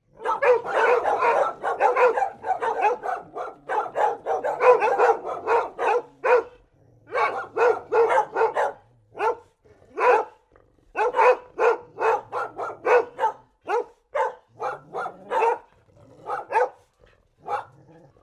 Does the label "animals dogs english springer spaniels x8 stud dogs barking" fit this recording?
Yes